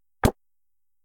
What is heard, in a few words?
wood hit object